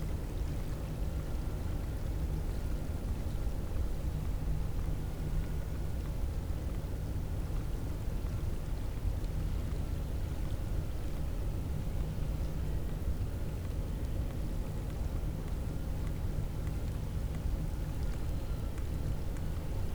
cachalot positif, Physeter Macrocephalus

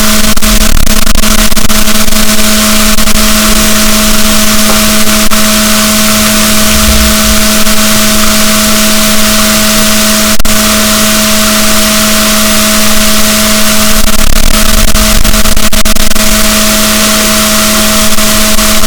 rorquals positif, BalaenopteraPhysalus, boat; SNR 6dB

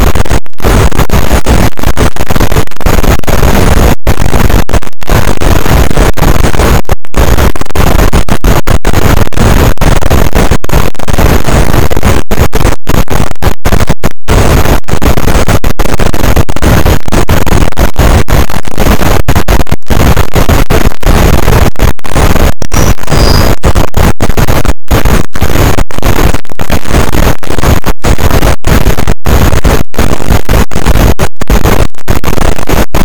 cachalot negatif, boat, Physeter Macrocephalus

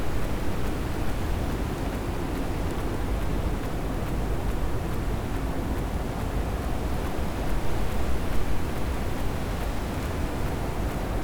cachalot negatif, Physeter Macrocephalus

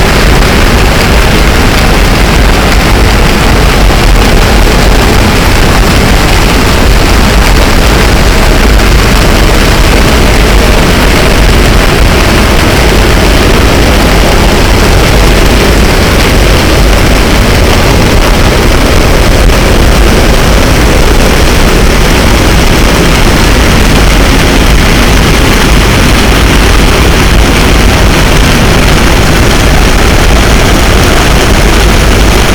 cachalot, boat; Physeter Macrocephalus

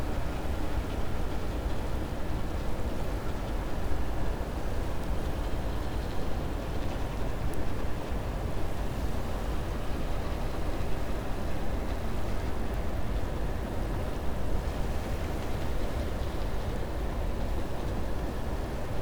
cachalot negatif, Physeter Macrocephalus

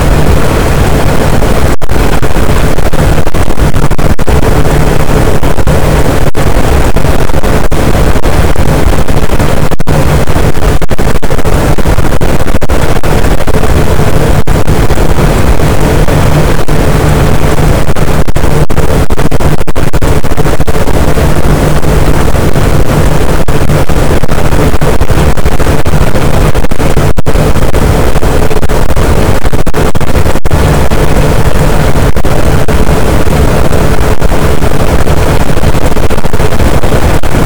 cachalot, boat; Physeter Macrocephalus

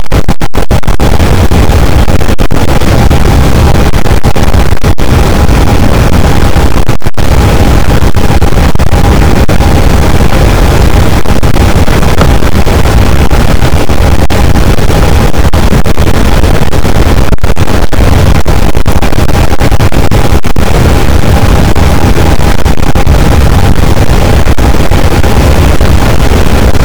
cachalot, boat; Physeter Macrocephalus

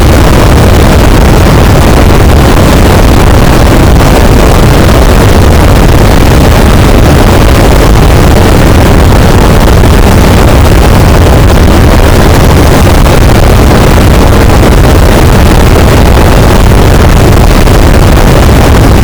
cachalot positif, boat; Physeter Macrocephalus

